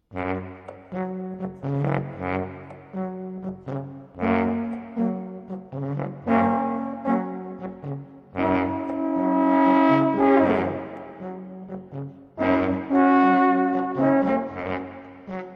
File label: Modern Jazzy